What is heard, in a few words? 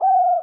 Amb Bird-Night Coo